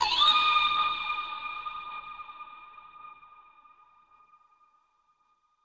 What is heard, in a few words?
victory